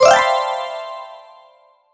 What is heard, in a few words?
Game Success